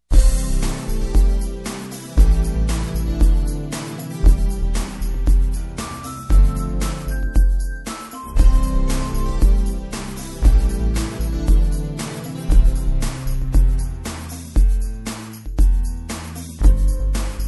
World Beat

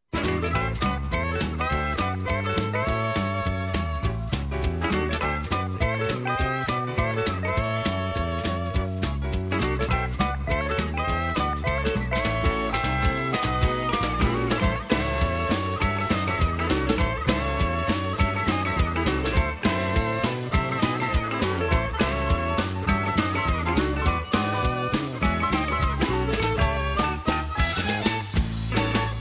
Western Swing

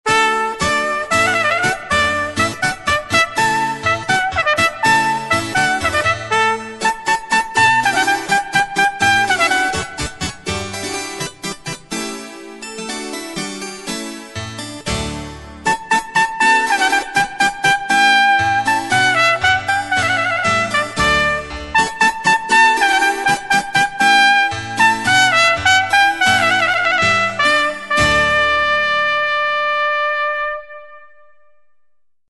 Water Music Hornpipe

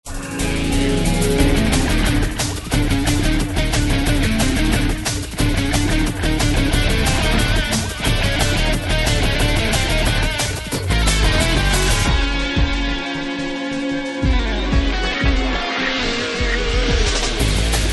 Fast Techno, workout music

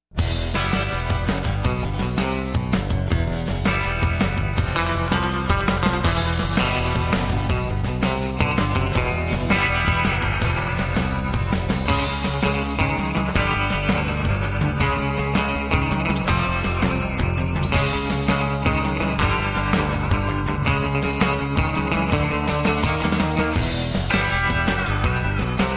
Retro Surf, rock n roll

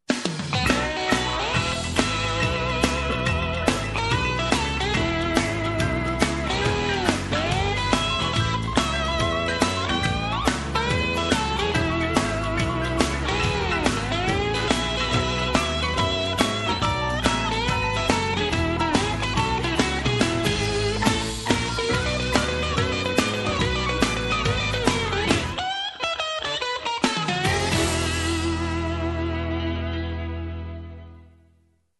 Country Rock Shuffle

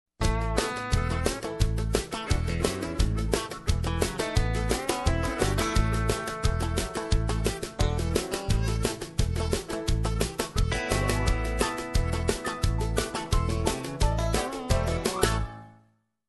Chicken and Dumplins, bluegrass music

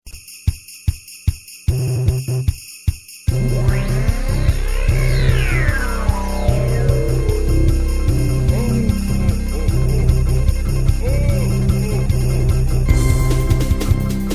Jingle Bells

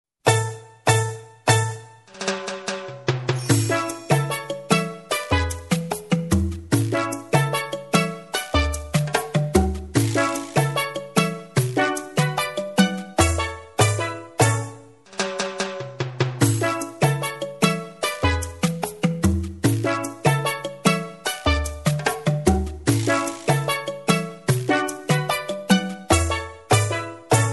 Tropical Dance

